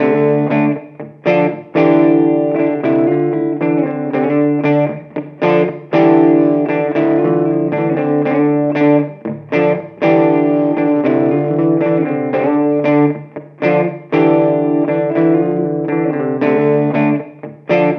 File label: Heavy 80s Amp; Torpedo CAB M